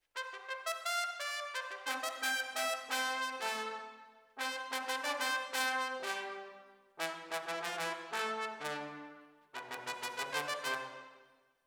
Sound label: ORTF, Jazz for piano